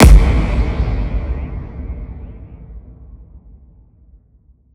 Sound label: Impact; Singomakers; Infected Psytrance; Impacts